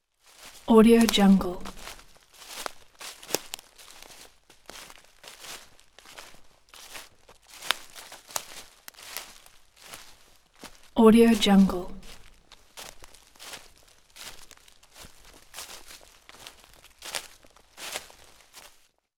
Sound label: footsteps on fallen leaves